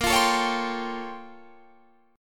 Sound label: A sharp Minor Major 13th, down, Guitar, Open D